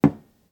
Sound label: Misc Wood On Wood bump